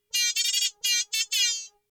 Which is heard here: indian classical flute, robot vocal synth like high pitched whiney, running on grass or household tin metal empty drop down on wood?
robot vocal synth like high pitched whiney